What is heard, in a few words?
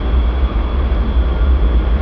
industrial, ambience; XDM